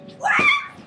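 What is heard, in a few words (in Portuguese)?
grito de sagui, marmoset scream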